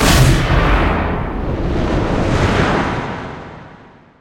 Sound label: Bonus Explode; 7 Wonders of the Ancient World